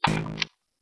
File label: pulse rifle fidget, weapons